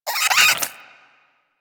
Vocal Attack Short, Hunting Critters; Warframe; Cryptilex

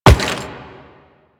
Run Rock, Footsteps; Warframe; The New War; Kahl; Quests

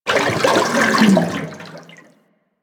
Coolant Tank Step; Warframe; Corpus; Arachnoid Coolant; Steps

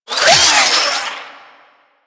Necramech Repair Drone Claw Spin; Enemies; Warframe

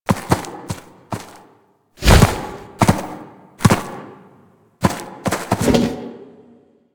Ram Lunge Forward; Animals; DE; Warframe; Quests; Duviri

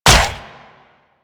Step Default; Warframe; Infested; Zealoid Prelate

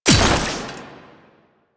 Ammo Cast Start; Warframe; Warframes; Frumentarius

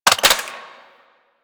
Hand Shotgun Mech; Grineer

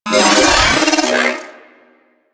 Riot Moa Vocal Pain; Enemies

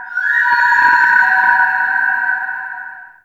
Root Cave Mysterious Whistle; Ambience; One Offs; Neathuns Root Cave

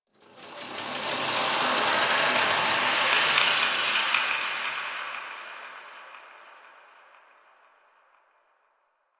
Exterior Space Rings Textures; Ambience; Warframe; Railjack

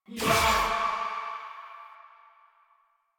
Choir Erupt Proj Throw; Warframe

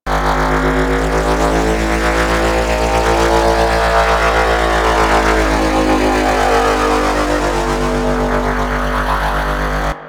Crp Ship Hologram Loop A; Ambience; Props; Warframe; Corpus Ship Remaster

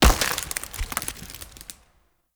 Tree Dead Small Destroy; Objects; Destructible Trees

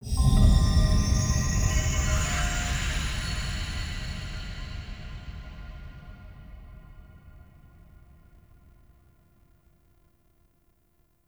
Arena Wake Poppet Stinger; Bromius; Bromius Quest

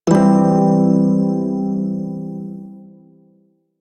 Prime Shawzin Pent Maj Chord F; Music; Warframe

